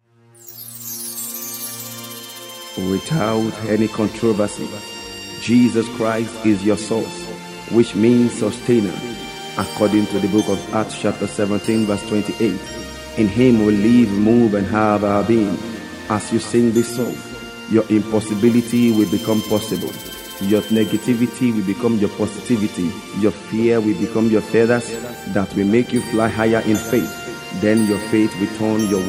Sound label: Sax